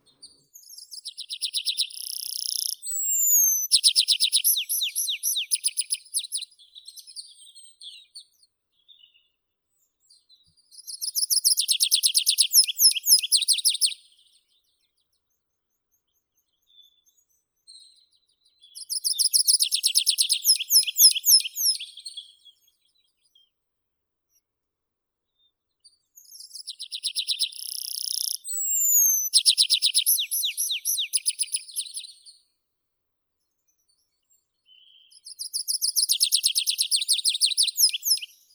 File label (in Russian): konek lesnoi anthus trivialis